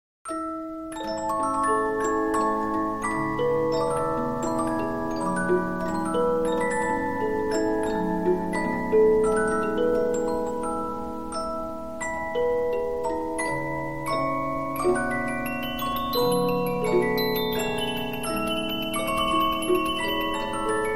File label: Polyphon, 15inch disc musicbox